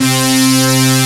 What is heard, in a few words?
C3, Moog Synths; E-MU Formula 4000 Series Vol. 3 – Analog Odyssey